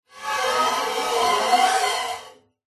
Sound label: musical guitar steel string knife scrape strings weird abstract scraping tone